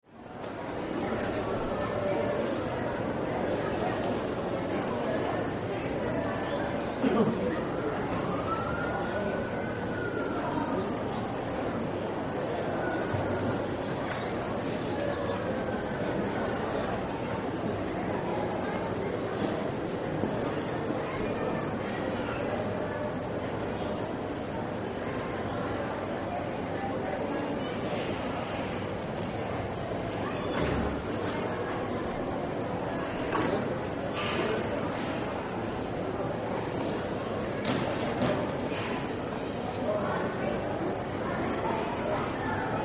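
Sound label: Mall Amb Light Steady, malls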